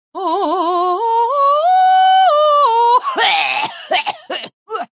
Opera Cough, Female, Singing then cough, comical, vocalization, opera, song, (human voice); Sound Effects:Voice; VoiceBox